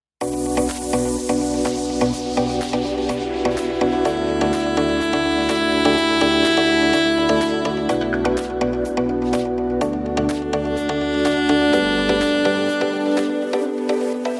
deep house sax

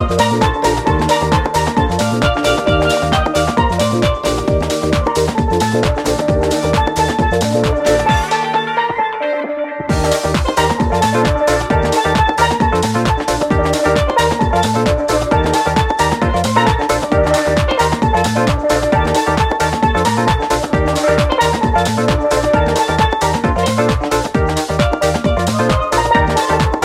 pans, Female Contemporary Steel Pannist; DJ & Pannist

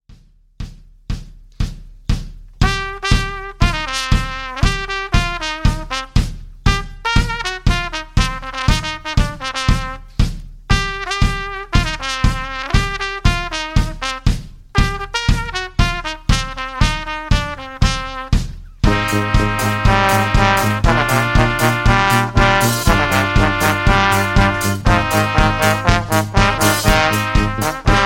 brass

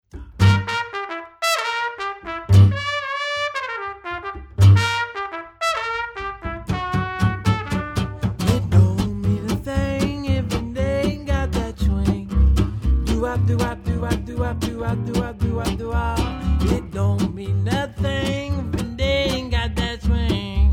jazz

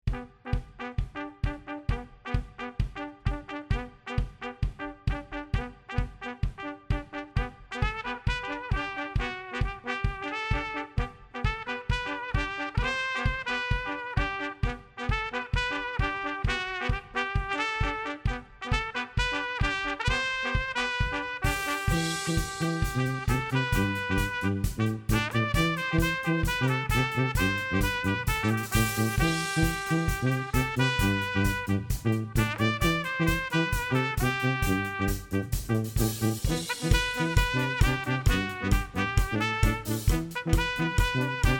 brass

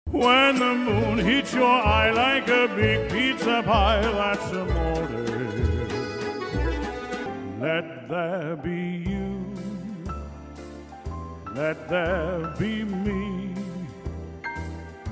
male vocal medley

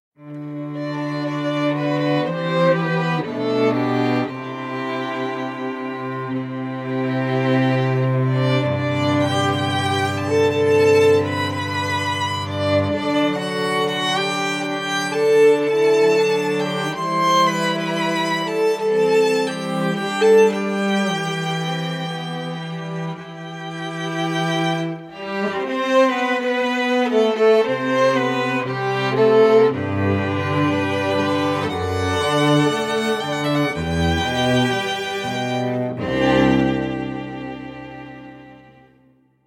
quartet; classical musicians

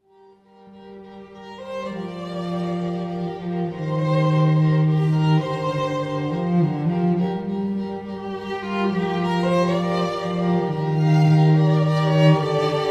strings trio

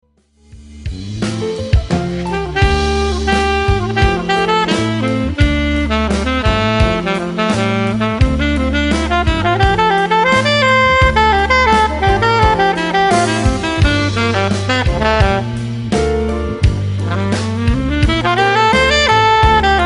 solo

